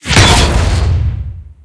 tank hit big unpierce

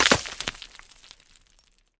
connect stone

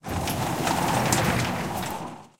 cockpit movement loop

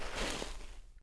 Foot Snow; Civ4 warlords

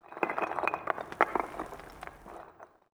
office occasional

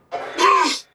pain grunt, Human Pilots; Titanfall 2

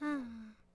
Setlr Child Fidgt Vox; Civ4 warlords

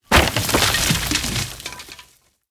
tank impact wood; tanks; War Thunder

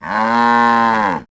Cows Mooing; Civ4 warlords; Resouces and Improvements

